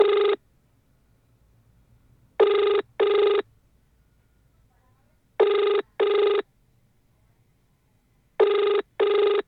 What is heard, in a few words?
Phone Ringing